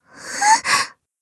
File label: section fail; Aristia Refreshed (Akani edit)